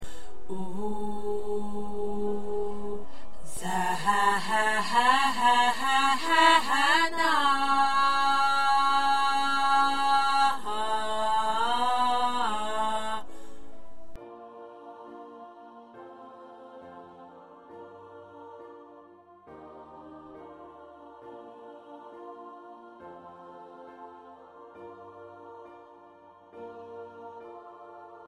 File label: Ensemble Tenor